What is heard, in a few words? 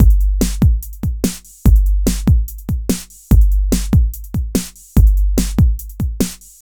Kick Snare; Sonic Foundry (Sony Creative Software) - Electronic Point Blank